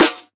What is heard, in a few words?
Snare